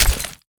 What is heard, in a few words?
Hit